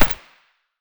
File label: scar silencer mono distant